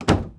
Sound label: door wood close end; Rust